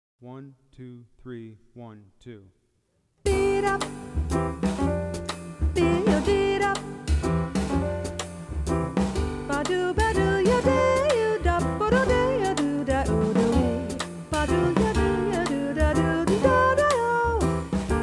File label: Total Blues, Solo Etudes Saxophone; Jazz Conception For Saxophone 21 Solo Etudes (Eb Saxo)